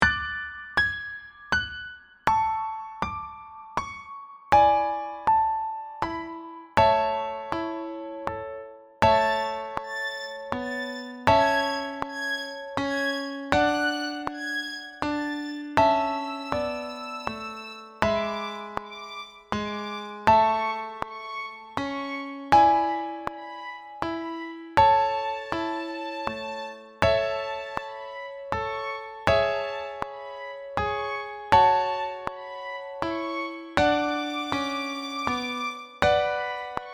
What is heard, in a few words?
French Folk practise track